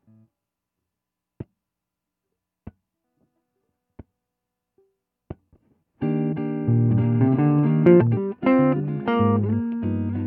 Tele Solo

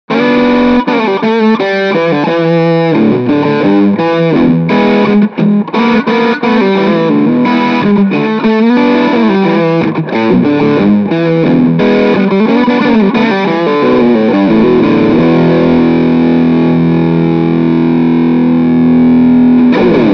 Loose High Fuzz Medium Tone Neck

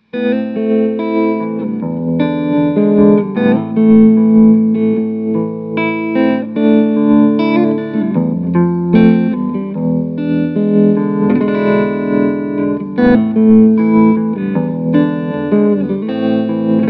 E6 Medium Depth Medium Speed

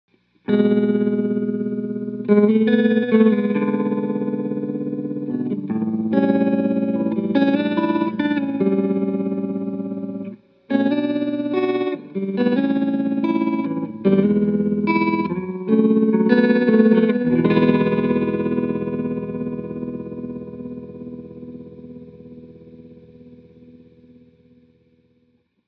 Medium Depth High Speed

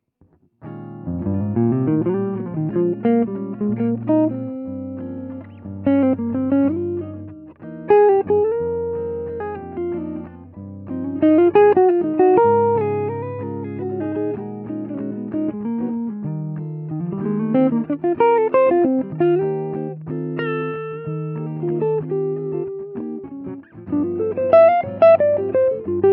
TGA-1 335 Solo

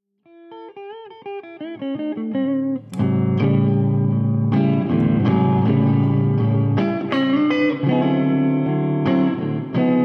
rv stereo

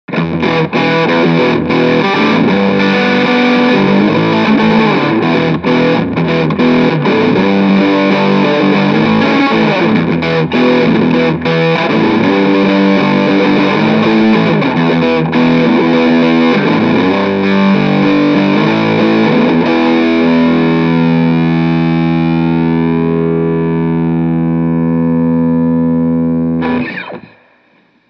Heavy Loose Full Tone

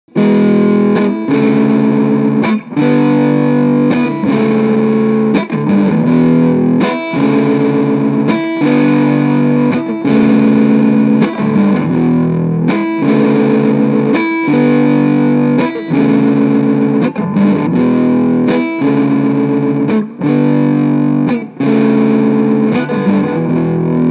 Loose Medium Fuzz Medium Tone

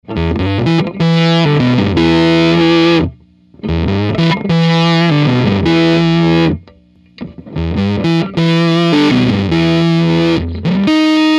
fuz lows tight loose

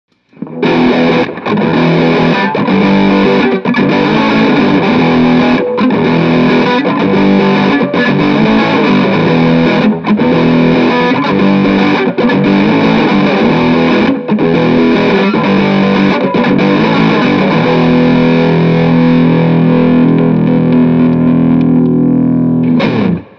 Heavy Loose

